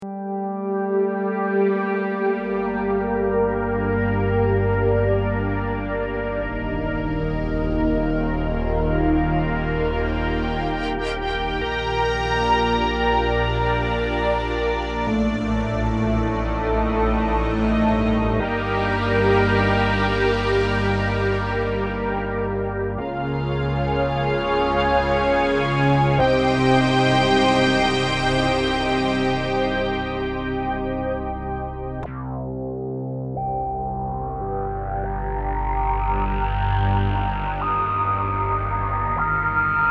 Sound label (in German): UDO Super 6 Pad mit Filtersweep und später FM